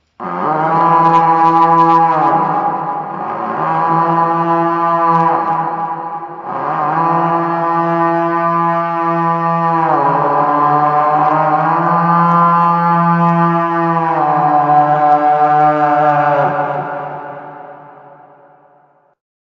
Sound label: dark ambient viking war horn sound